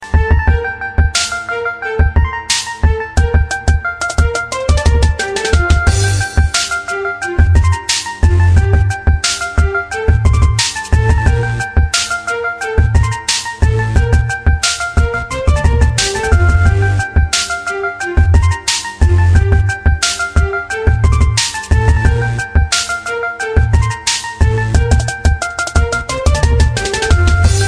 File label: romantic arabic; ringtones